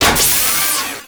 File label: Animal Foundry Pillar Raise; Liset Machines; Tenno